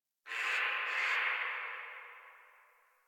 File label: Tree Distant Critters Happy Calm; Ambience; Warframe; Duviri